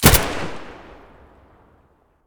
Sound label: abakan muzzle; STALKER; IWP